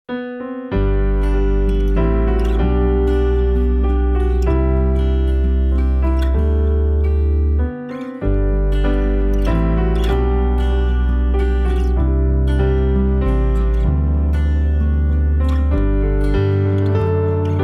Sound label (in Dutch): Onze Vader instrumentaal; kyriale